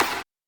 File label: Snare, PsyTrance